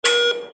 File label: intercom beep sound